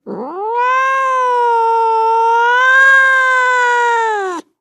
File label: Cat Domestic Growl